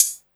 HH, 160 BPM, Drum & Bass; Ueberschall; Drum & Bass Flash Trackz

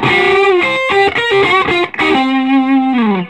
SOLO, Vintage Blues Guitar

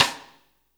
STICK; East Collexion; Drum 1

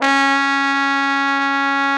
TROMBO; Trombone

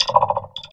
vox, electro vox

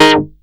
short chor; Masterbits; Vintage Voltage; SEQ-FX

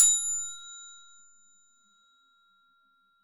TEMP F7, temple bells; SoundBytes - Early Patches

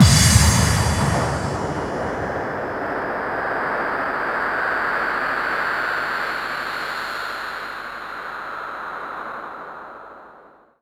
BD-FX, Dance Mega Drums; Best Service

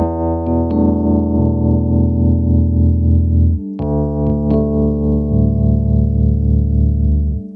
jazz

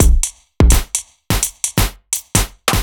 Kit Heavy Swing, 85bpm; off the grid

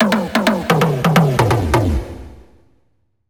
130 Beat Fill; Zero-G - Future Wave

